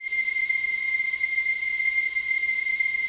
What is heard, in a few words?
HIGH STR, House Dance